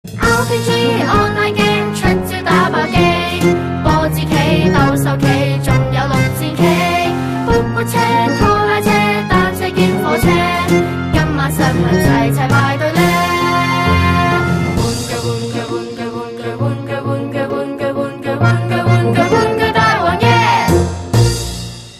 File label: ringtone; king of toy kingdom